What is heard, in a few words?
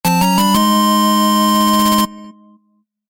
Get Rare Material Fanfare (8-Bit)